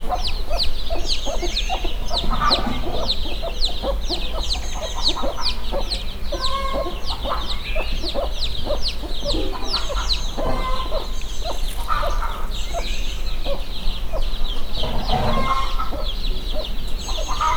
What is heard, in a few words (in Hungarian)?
kárálő tyúk, csibe magyar tyúk; állathangok; paraszt udvar